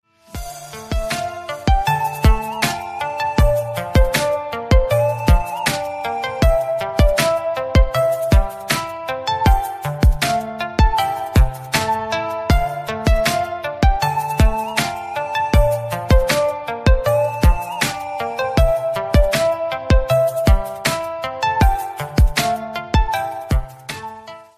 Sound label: Galaxy Note 10 Pro ringtone